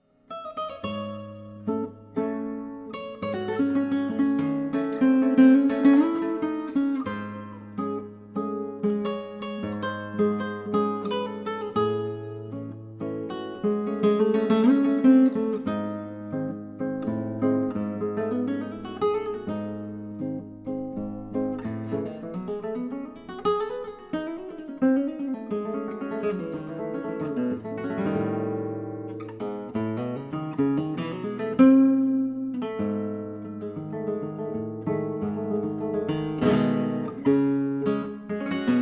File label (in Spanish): Guitarra Iberique; Fabienne Magnant